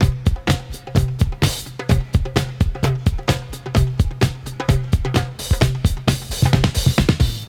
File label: 128 bpm modern drum groove c key